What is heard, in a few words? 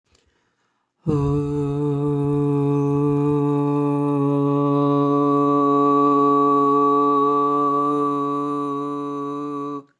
UUUUUU andro to masc; cos'è il peso della voce